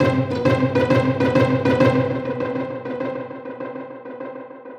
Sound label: Pizzi lay, strings